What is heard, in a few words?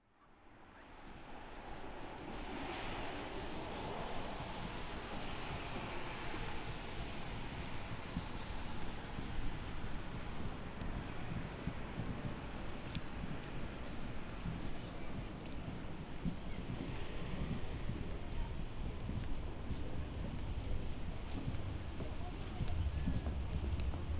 binaural